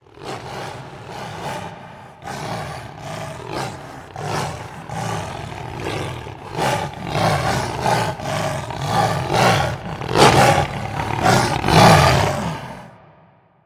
snork tunnel coming

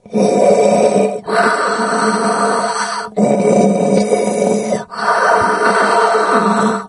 rebreather, hazmat zombie; doom3

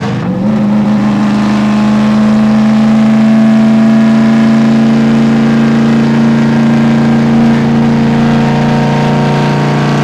jalopy second

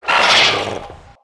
attack

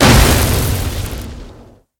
impact ground light

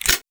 pistol draw

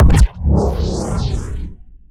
rise cast

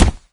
step; doom3; commando

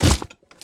step walk

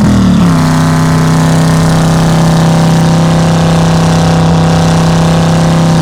charger second; charger69; master chris

